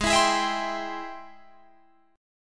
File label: Ab Augmented Major 7th Ab Guitar Drop D